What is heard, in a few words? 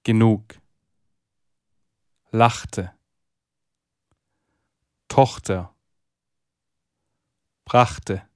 drill